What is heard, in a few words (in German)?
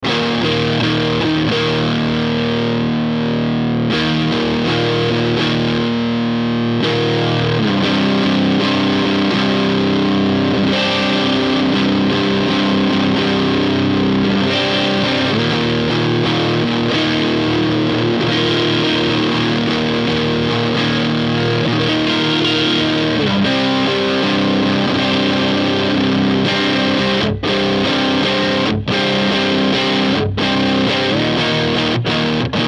Ibanez RGD71AL Zerre Middle